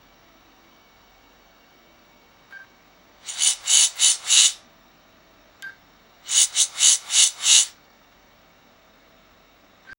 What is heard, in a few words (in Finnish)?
vessan vetminen, draining